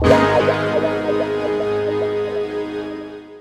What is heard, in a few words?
Guitar Stab